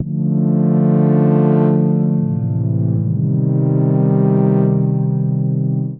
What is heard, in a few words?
Synth Deep Chord D Minor 160 bpm; MIDI Clips; Tonal; Pads; Skitter and Step; Ableton Factory Packs